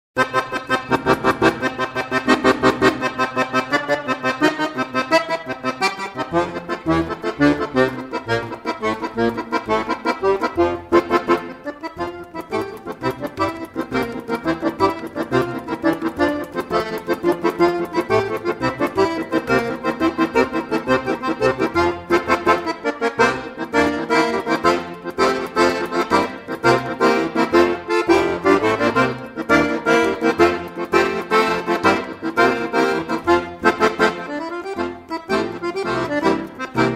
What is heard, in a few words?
Rumba Espana